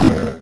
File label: wolf hit; wesnoth